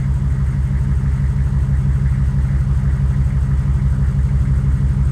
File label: Humvee Idle Loop; Trucks